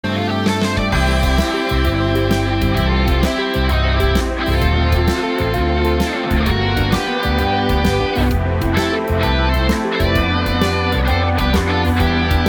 christmas medley strumentale no canto

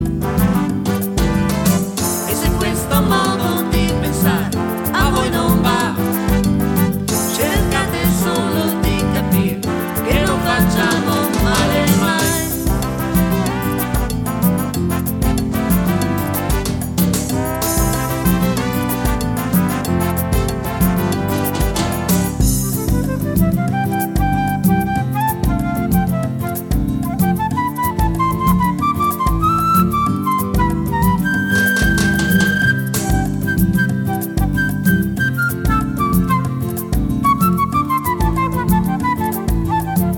salsa

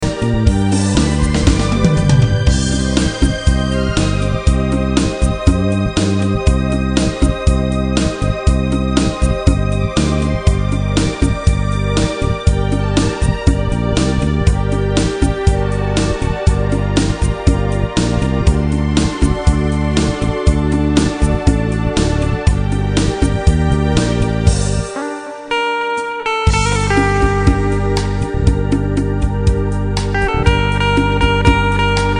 suona chitarra

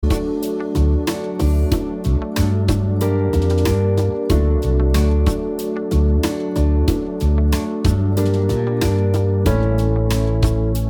rumba moderato

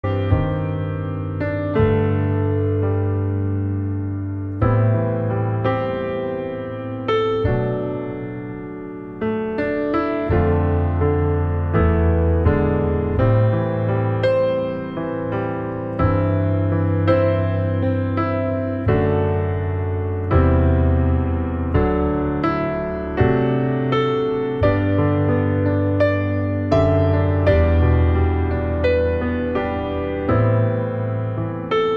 pianoforte solo

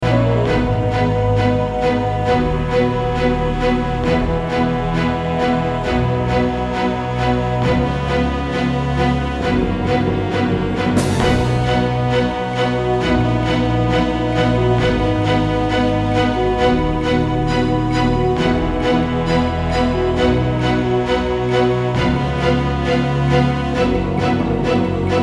orchestra; orchestra scala di milano

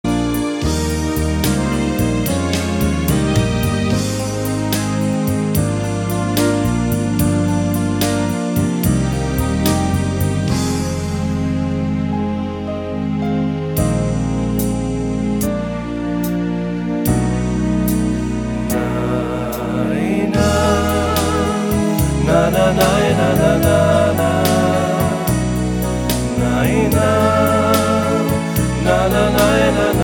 terzinato

